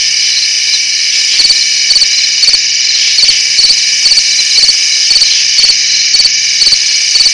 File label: Crickets chirping in the night sound effect